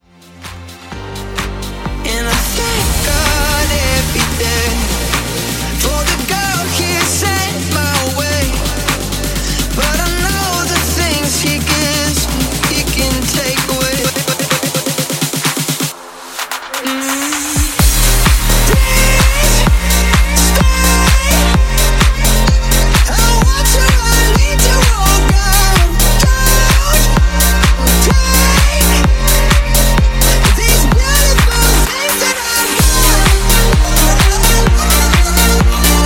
Pop House 128bpm

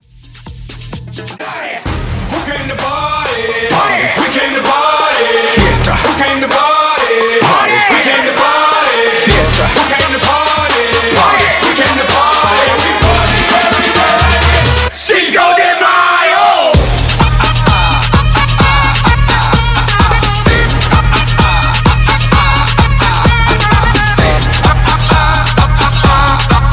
Hype Intro Outro 129 BPM; remixplanet

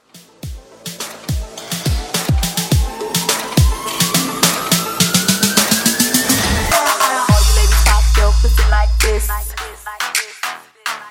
Twerk Bootleg 105

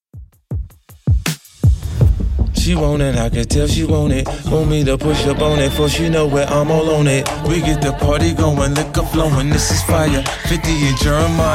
2ks Redrum 128bpm

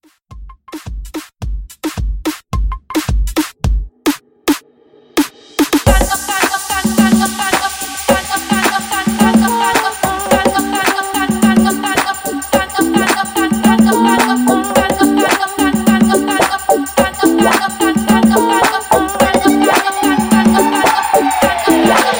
Moombahton Rework; Remixfreakz

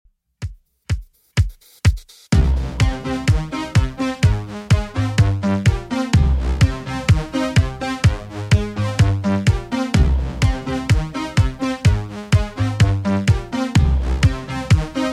80s Redrum; 126pm

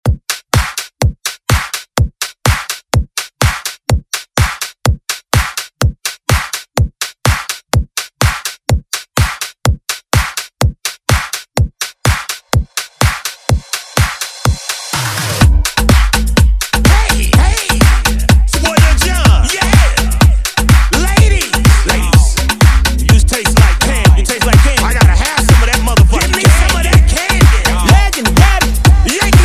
Latin Dance Redrum

